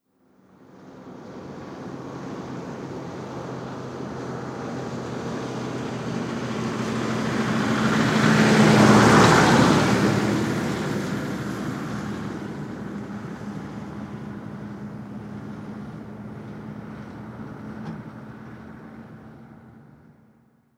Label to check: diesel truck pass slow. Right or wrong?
Right